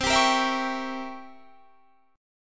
C 7th Flat 9th C down Guitar Standard; C7b9 strummed; JGuitar

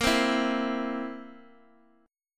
Bb Suspended 2nd Flat 5th, Guitar, Half Step Down

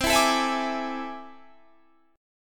A Minor Major 11th

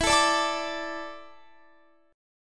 E 7th Suspended 4th, Guitar; E7sus4; strummed

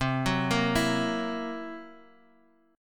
C 7th Flat 5th, C, down, Guitar, Open F; arpeggiated; chord; JGuitar

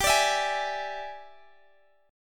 E 7th G, guitar; E7/G; strummed

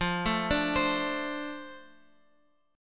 F 6th F down Guitar Open F; F6 arpeggiated

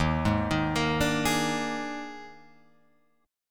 Eb Major 7th Suspended 4th Eb, Guitar, Open F; arpeggiated; 3,3,3,5,5,3; EbM7sus4

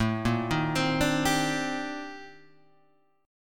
C Minor 9th, A, Guitar, Standard; arpeggiated; JGuitar

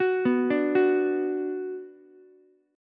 B Minor, Ukulele; Bm arpeggiated; 11,11,10,9; JGuitar

